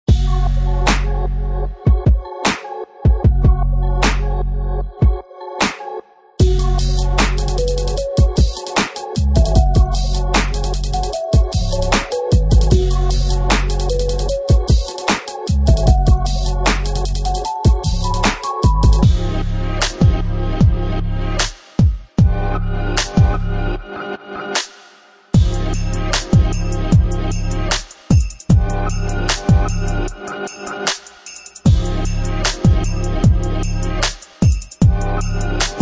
Trap City; Hall Samples

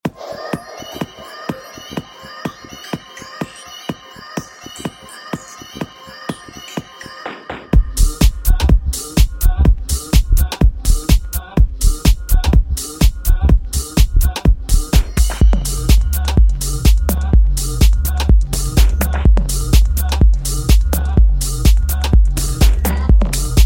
Huge Tech-House Basslines; Catalyst Samples Ingredient

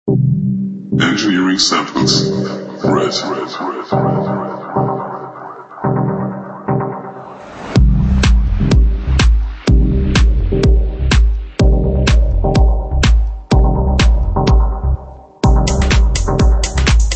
Summer Tech Vibes; Engineering Samples RED

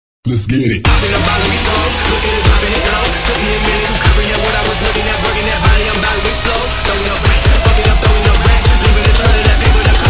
Riddim Dubstep; DiezelPunk

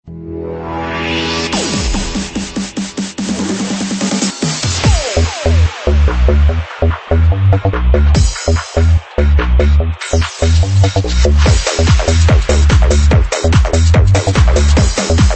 Hard Dance; Charlie Goddard Hard Dance Sample Pack - Volume 1; Freestyle Digital Rec

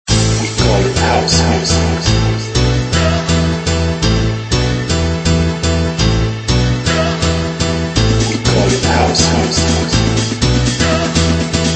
Tech House; Tech House Tools

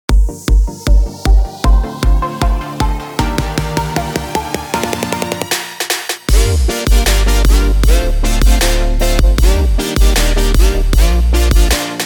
FUTURE BASS; FUTUREBASSv2

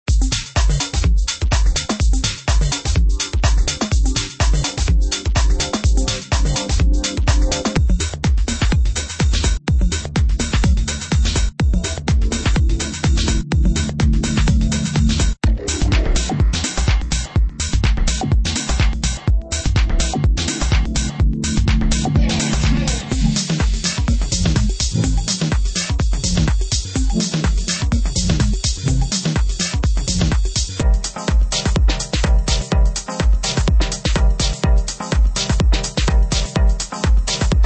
Beats Techno Tech House

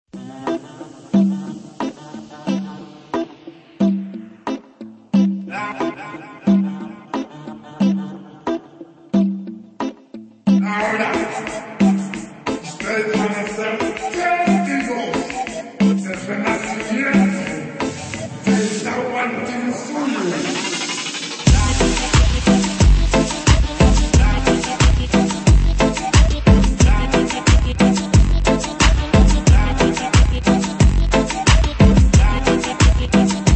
Inca Beats; Sonnemm

